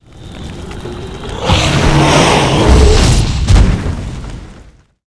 elemental attack, monster

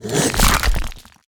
lancer sefx; monster

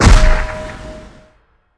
skill explo

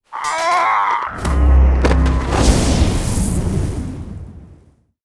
temwarrior death, monster

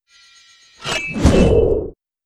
ice lord, monster

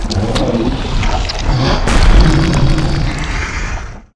sandboss

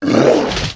mech ape archer damage, monster

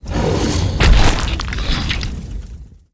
dragon boss; monster

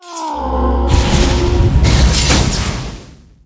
felidae attack, monster

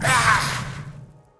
death general damage

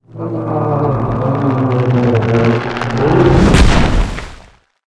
tree boss attack, monster

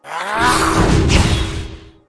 death general attack

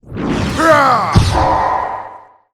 kentauros, monster

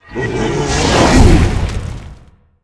dark evil wizard attack, monster

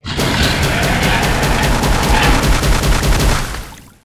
leviathan, monster

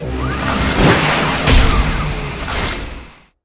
attack

